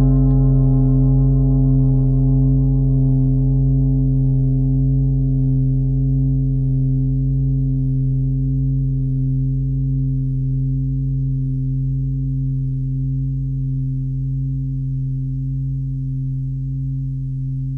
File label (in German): fuss klangschale